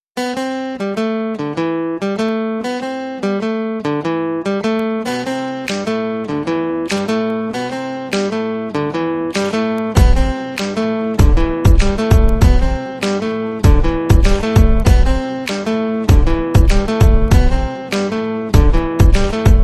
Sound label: BG Tone